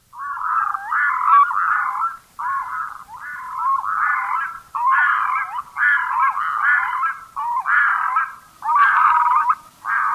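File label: red-throated loon courtship duet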